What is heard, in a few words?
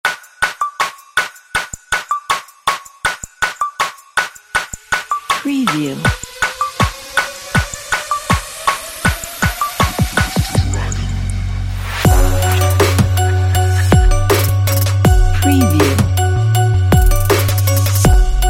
heavy trap